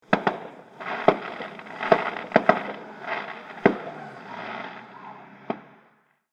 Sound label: Fireworks Multiple Bangs With Ambience